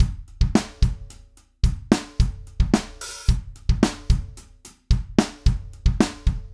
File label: sample Groove Agent SE